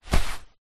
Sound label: person grab person wearing raincoat; foley